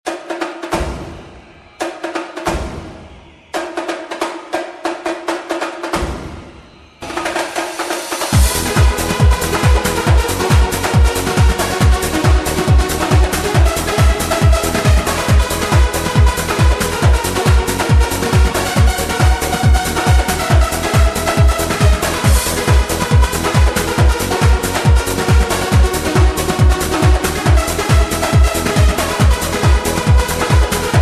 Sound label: bongo song